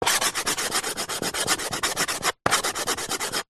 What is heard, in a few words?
chalk stick thick scribble on small chalk board